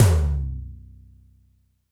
TD-20 Tom A, Roland TD-20 Toms

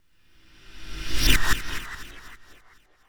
Slippy Short

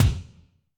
Kik Techno, Alesis D4, Drum Machines

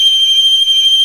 FLUTE & BELL; OMI - Universe of Sounds

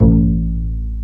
STR Cb Pizzicato; Roland L-CD702; Cb Pizz 3

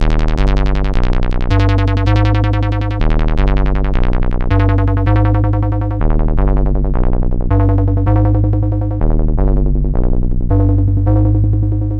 Stubs Bass; Sonic Foundry (Sony Creative Software); Knutrix Drum & Bass

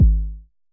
Basic Kick G#1, Dirty Kicks, Vermona Kick Lancet; LookIMadeAThing Kicks

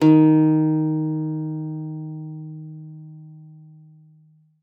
D#3, GUITAR; Xsample VOL-2

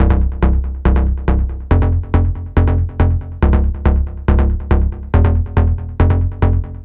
NRG Bass, Trance; Sonic Foundry (Sony Creative Software); Trance NRG VOL-1

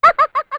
Laughing Girl, Yamaha DD-35

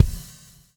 SLICK, Backbeat

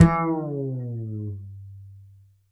Upright Acoustic Bass

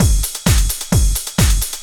techno, DRUMLOOPS

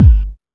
Techno E; EMU XL-7

